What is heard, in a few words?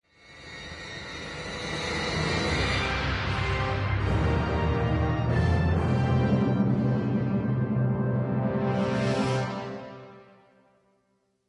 intro music; avengers